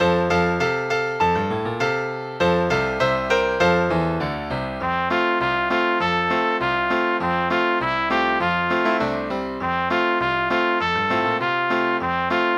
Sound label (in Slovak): Vandruvala blška, pre tr. a klav.